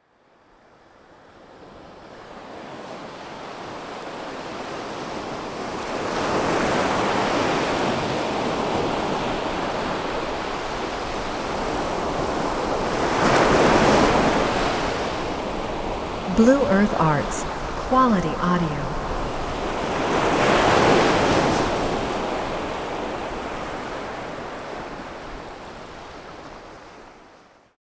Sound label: surf